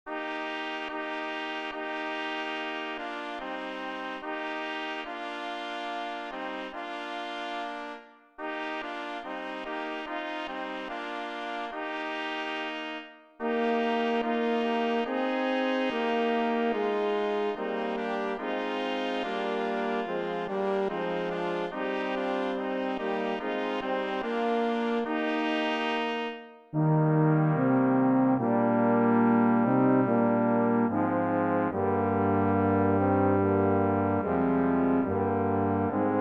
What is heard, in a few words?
Rachmaninoff Ave Maria for brass